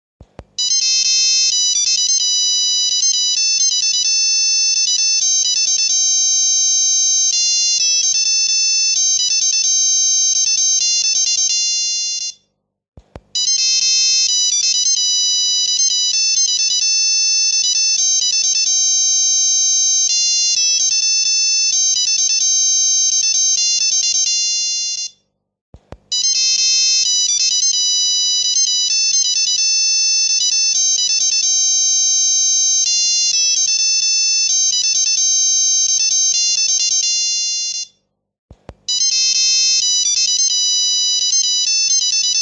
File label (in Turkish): nokia zil sesi ringtones